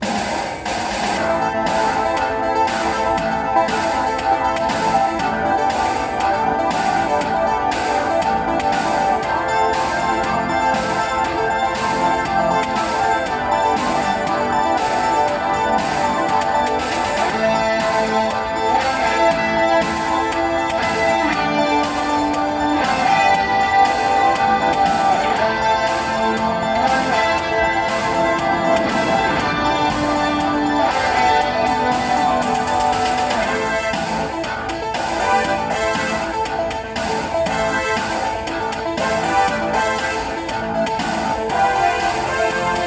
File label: Laptop Music; sons of the forest